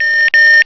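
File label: checkpoint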